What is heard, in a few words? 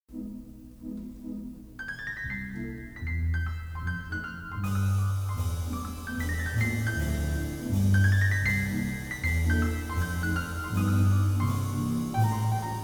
Improvisation